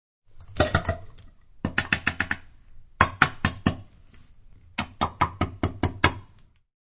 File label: Emptying food from tin can.; Kitchen; Household